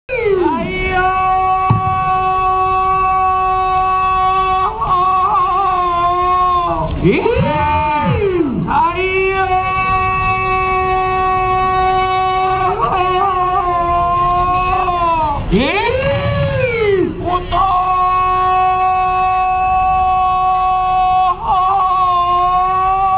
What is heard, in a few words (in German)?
sanam luang, abendliches Fest- Sanam Luang; Bangkok; Bangkok 2; thai cambodia